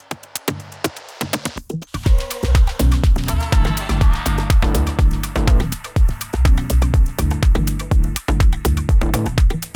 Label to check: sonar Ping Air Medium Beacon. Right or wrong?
Wrong